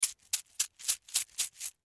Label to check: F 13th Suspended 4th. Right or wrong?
Wrong